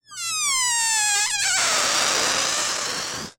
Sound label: deflate; drawnTogether